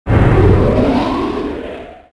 pedestal start; movers platforms

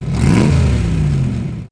turbo; Pure Pinball

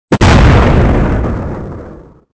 explosion short; weapons; Soldier Of Fortune II Double Helix; XBOX

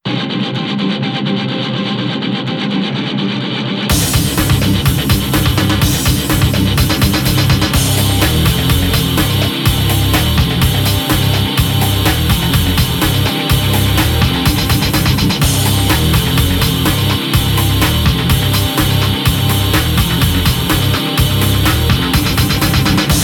forest fight, music; Painkiller Hell Wars

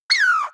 Squirrell; Animals; XBOX; BassProShopsTrophyHunter2007

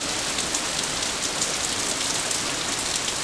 rain ground; Quantum Redshift

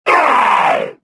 death; galak

